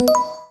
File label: notification nfc success; BlackBerry OS-10